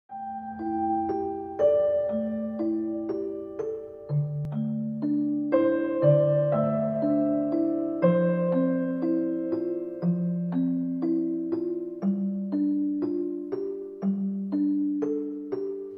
Piano Relaxation, Spa

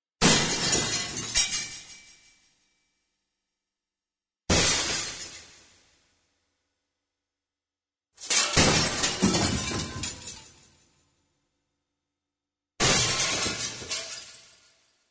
Breaking Glass; SONIDOS TERROR

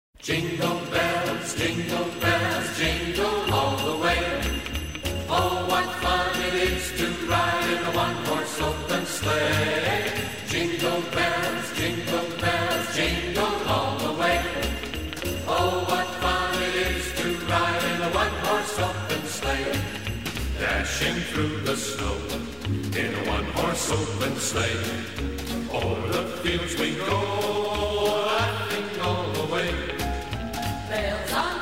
Jingle Bells, Campanas De Tintineo

